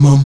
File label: VOX MUM C#3E, Afro Chants; Roland LCDP11 Africa VOL-1; Stacc Mums